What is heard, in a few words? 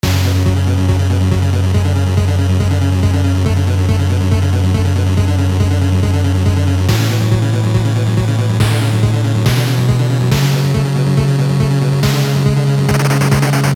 8-bit last mission intro